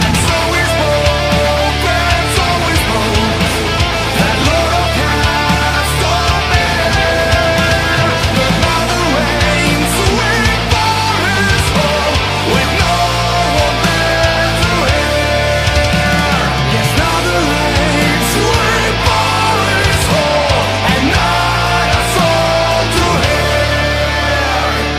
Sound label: Metal Alarm; GoT